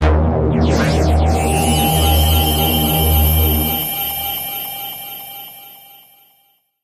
Power Flux Pulser

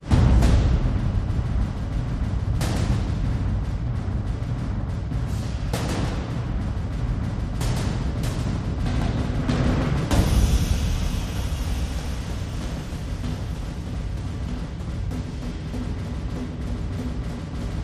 Epic War Drums

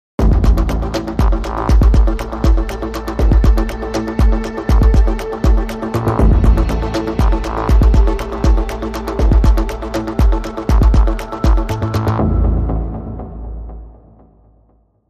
Bumper

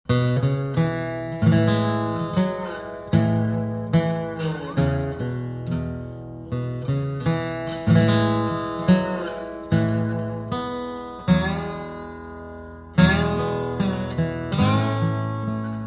Folk Ballad; Folk Ballad 1

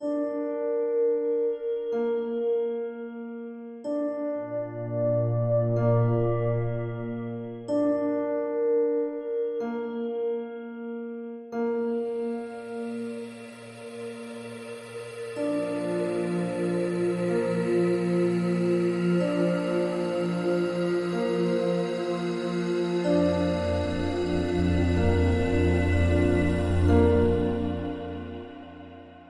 Mission Drone, Piano

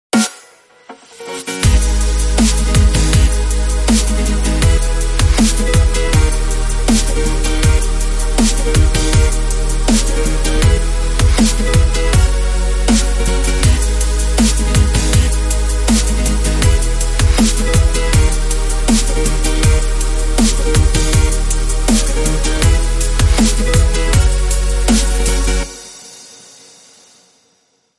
Fast String Short Rhythm

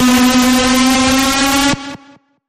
Bend, B; Feel The Bend

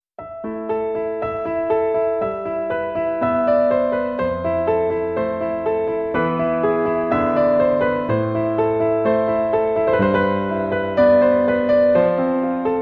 Piano In Sadness

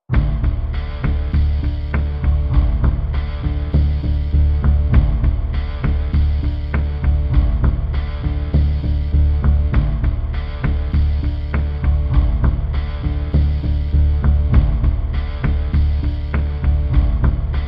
Pulses Only

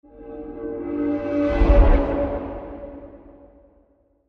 Metal Flyby

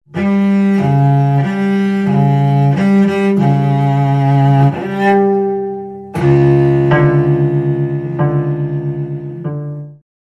Cello Danger Eerie

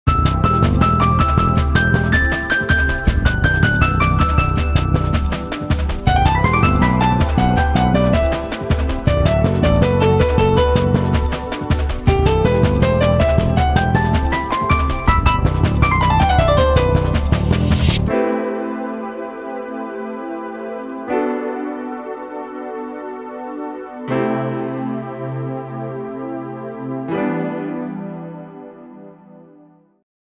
Jazz

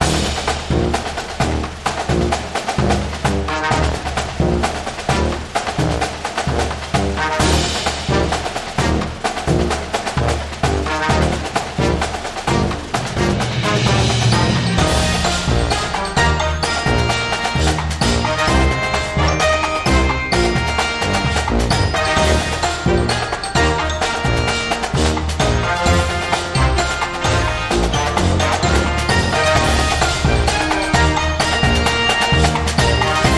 Drumline March

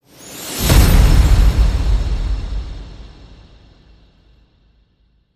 Tinsel Time Whoosh Hit

